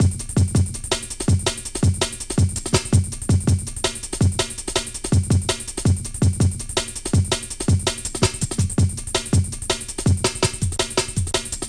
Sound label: DRM, Drum & Bass; Best Service ProSamples vol.03 - Drum & Bass 1; AKAI